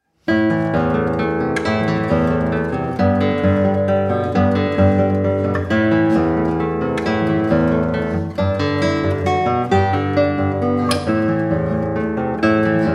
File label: Jig